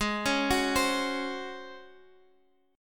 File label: C sharp Major 7th Suspended 4th G sharp